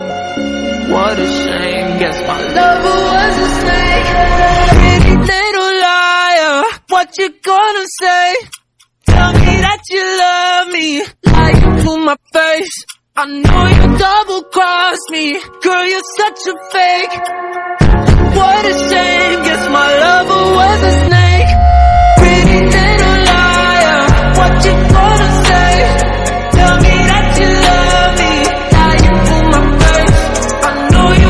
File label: English song